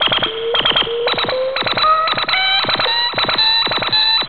Precautionary Warnin

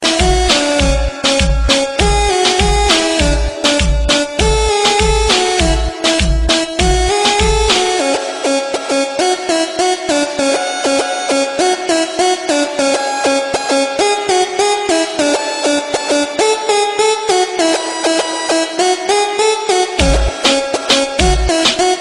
Voilin

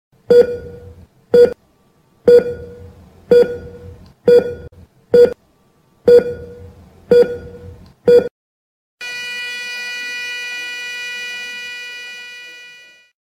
Ekg Heart Beat

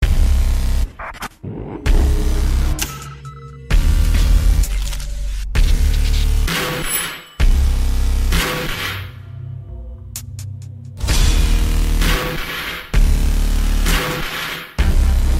Heavy Electro Bass

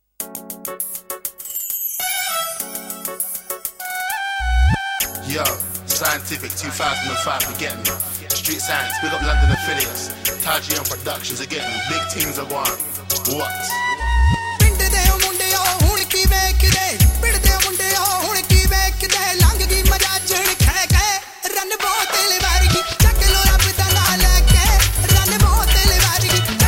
Punjabi Old

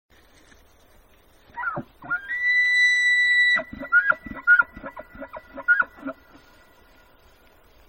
Elk Call

